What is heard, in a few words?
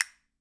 CASTINETS; Sonic Reality; Interactive Drum Kits